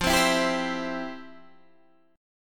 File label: F Major 7th Suspended 2nd, F, down, Guitar, Standard; FM7sus2 strummed; JGuitar